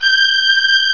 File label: String (G5); Flying Nightmares Demo; Flight Sims